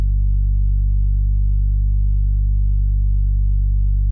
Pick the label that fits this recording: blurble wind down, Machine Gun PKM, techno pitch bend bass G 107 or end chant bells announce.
techno pitch bend bass G 107